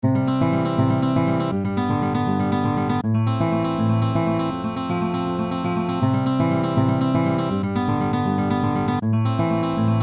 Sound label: triplets